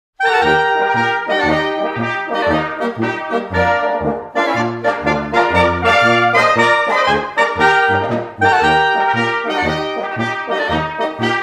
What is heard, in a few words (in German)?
Doerfliche Blasmusik Maibaum Polka